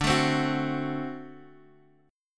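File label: D 7th, D7 Chord strummed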